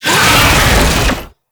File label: Infested Melee Start; Oni